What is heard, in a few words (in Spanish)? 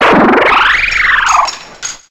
Grito de Klinklang